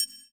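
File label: TRIANGL, drum machines; JV-1080 DRMS; EdgeSounds; Drum Mashines VOL-1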